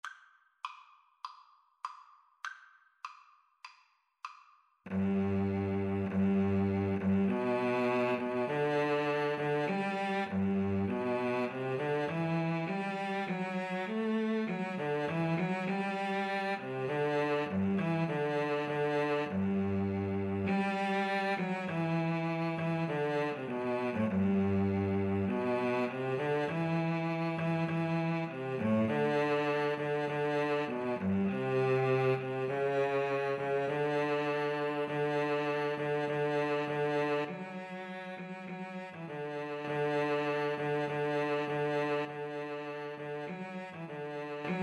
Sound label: fireworks overture, violin cello; Violin-Cello Duet